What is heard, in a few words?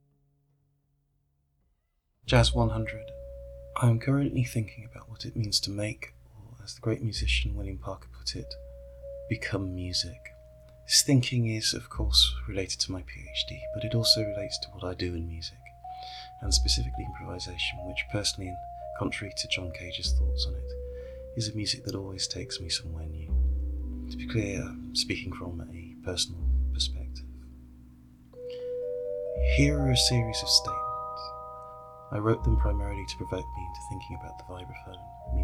jazz